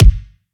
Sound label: kick raptor; Drum One Shots; SD MIDNIGHT Sample Pack; Slate